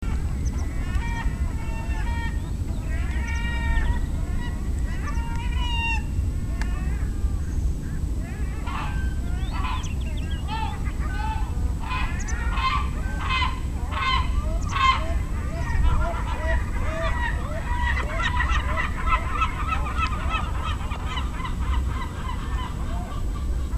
L mongolicus long call

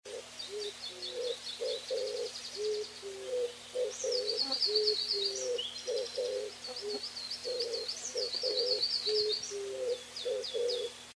Streptopelia orientalis

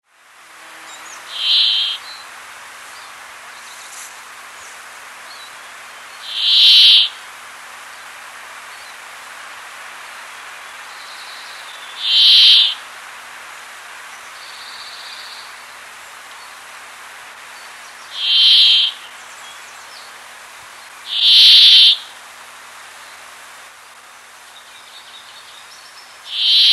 Fringilla montifringilla

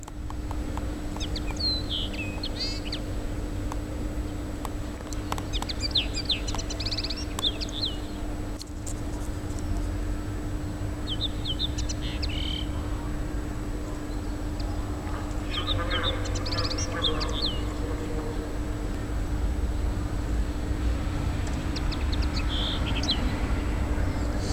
A cannabina male song

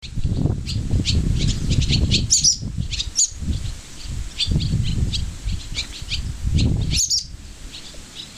H rustica tytleri calls & alarm

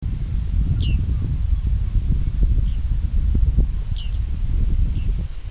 P biarmicus

